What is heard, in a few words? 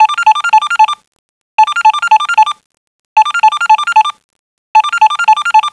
PHONE